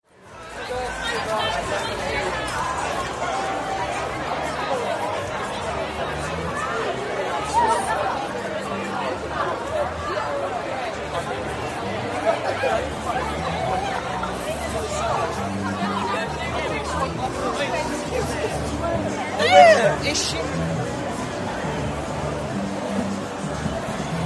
walking through evening nightclub crowd at buttermarket